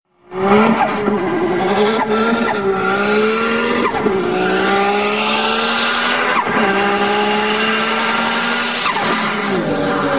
Supra Turbo 2jz-gte

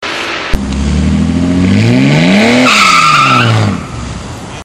Mean Car Engine

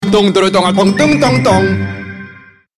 jingle; eBadah 2020

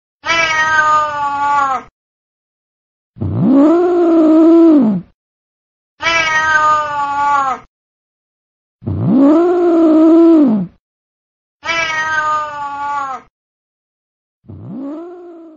Angry Cat Miau

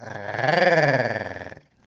Sexy groar

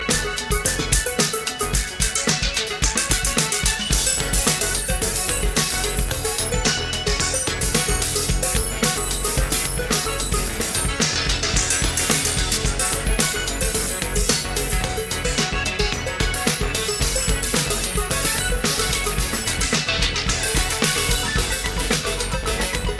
Arp

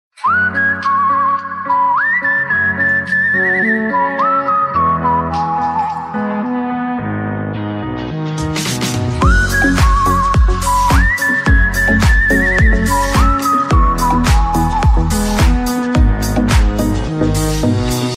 Whisper

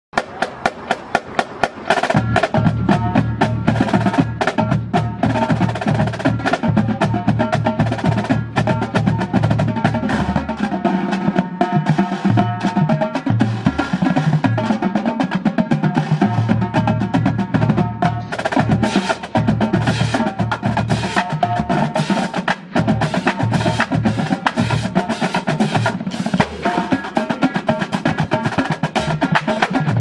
Marine Corps Drums

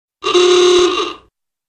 Horn Retro Car Short

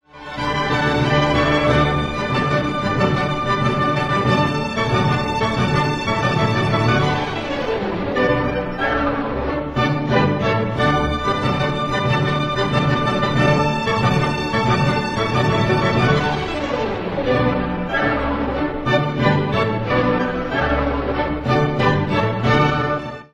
Classic Nokia Style

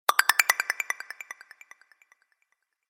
Blipper

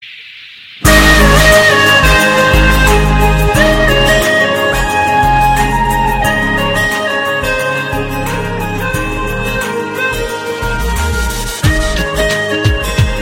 Love Tone; Rajneeti

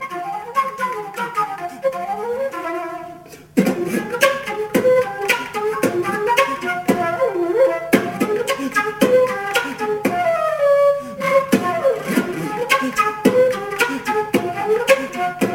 Beatboxing N Flute

